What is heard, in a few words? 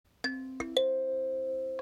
Kalimba